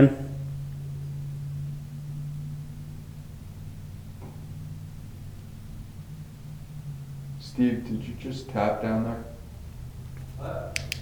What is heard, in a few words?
tap; tapping